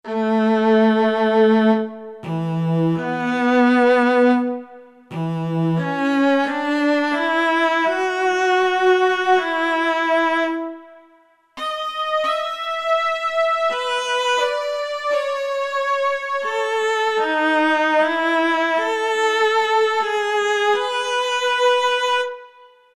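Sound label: strings; str - Conductr; E-mu Proteus FX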